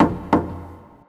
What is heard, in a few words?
Knock